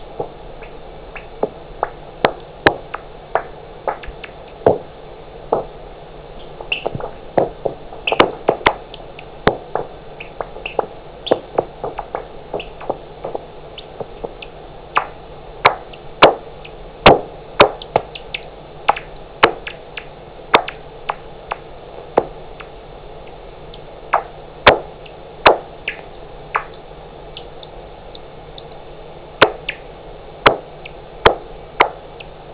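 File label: nyctalus noctula; fauna; sismisi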